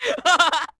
Sfx Bump Boy; Charlotte's Web: Wilbur and Friends

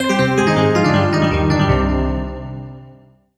Solo EPiano Negative; MUSIC EFFECT; startide

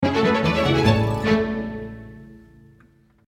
You Win; Ezaron Chronicles

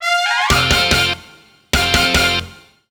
Touchdown; NFL Rush Zone; Leapster Explorer

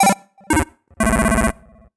Solo Chip Square Negative; MUSIC EFFECT; startide

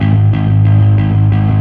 Fuzz G sharp 1

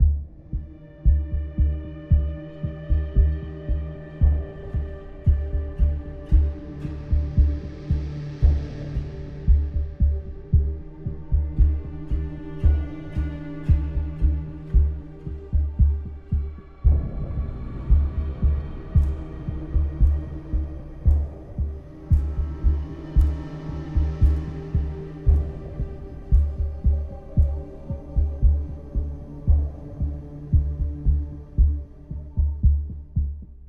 Mood Tension Light

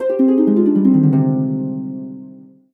Solo Harp Negative; MUSIC EFFECT; startide

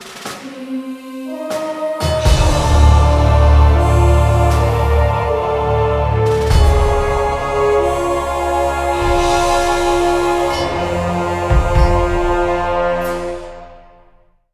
Round Start; Zombie Stalingrad

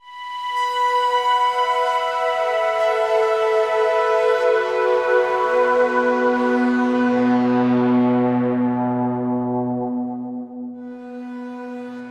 Synth B Long

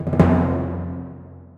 Timp rollin

